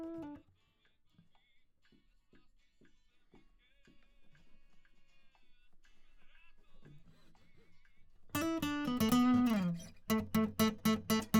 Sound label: Solo; Reaper project